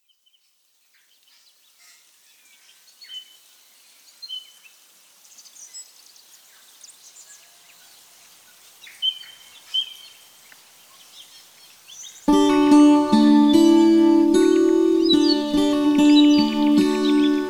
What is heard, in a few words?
percussion; ambiance; music smorgasbord